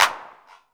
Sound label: claps, techno; Techno ID; single-sounds; single-drums